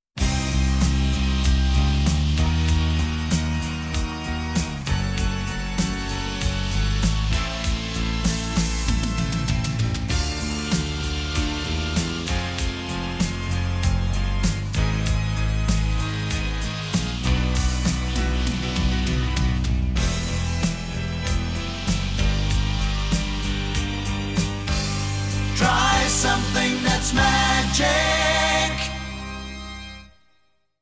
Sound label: 30 Sec. Music Bed With Tag Sing